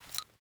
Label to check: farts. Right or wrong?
Wrong